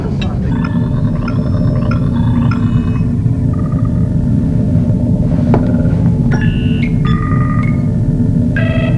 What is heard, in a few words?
Science Station Sounds; trek